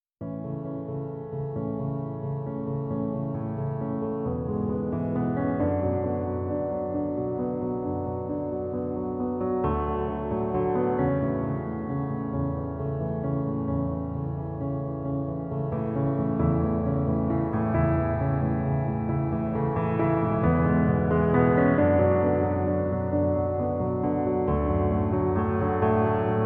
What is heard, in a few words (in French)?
piano